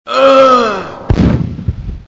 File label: death; hl2dm